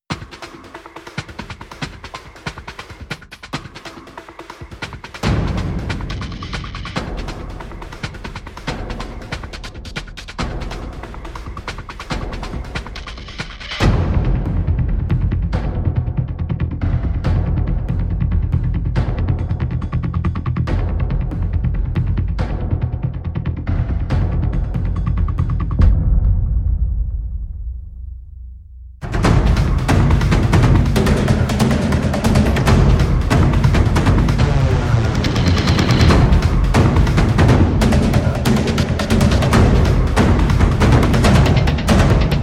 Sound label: no pads